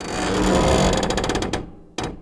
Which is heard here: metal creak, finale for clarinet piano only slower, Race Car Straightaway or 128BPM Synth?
metal creak